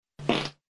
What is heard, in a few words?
Fart; Cliff Lee